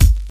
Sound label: clean kick sound g key, Royality free kick drum single shot tuned to the G note